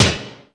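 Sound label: GATE tom; Crypton - DrumBase5000; GATE KITS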